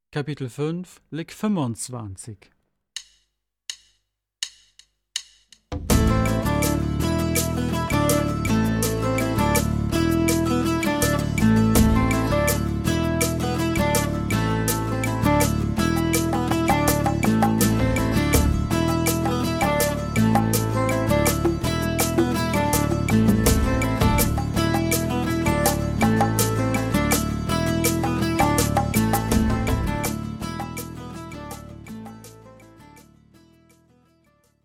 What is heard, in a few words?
Latin Style Guitar